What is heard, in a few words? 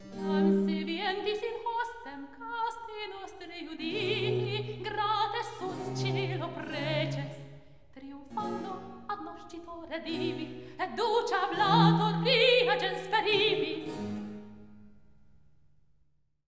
Recitativo; Juditha Triumphans Oratorio Part 2; Ozias; Jam Saevantis In Hostem